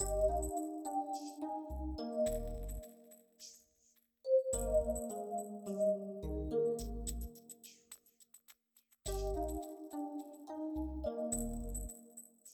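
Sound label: music caves; yo frankie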